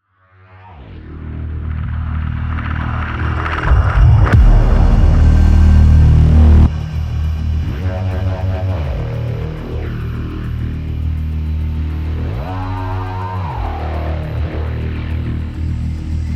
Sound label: Main Ambient; ARC Complex - Samuel's Base